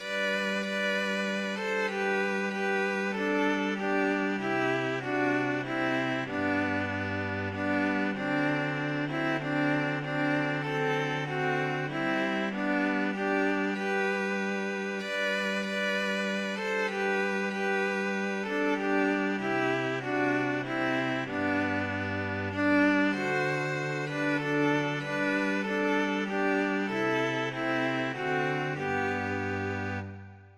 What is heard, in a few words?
string quartet; away in a manger